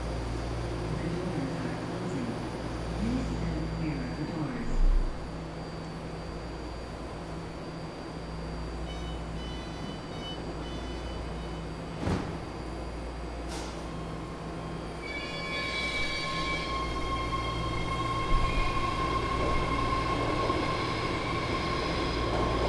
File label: Door Closing, BART Legacy Fleet Inverter